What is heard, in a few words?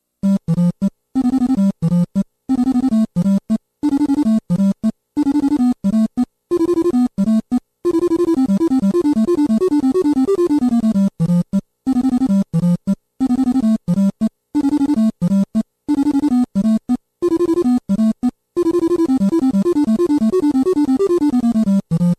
nes; ice climbers